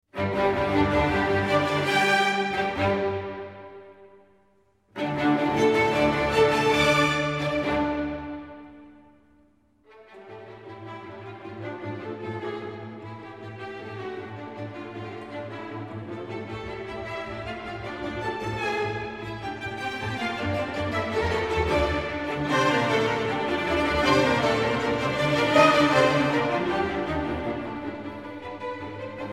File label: Simple Symphony for string orchestra, Frolicsome Finale; Britten